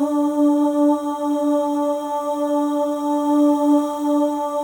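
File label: OH, female OHS, Voices; Symphony of Voices; Spectrasonics; FEM.1 OHS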